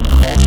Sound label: Multibass; Generators; Harmor